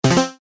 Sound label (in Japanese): 決定 低音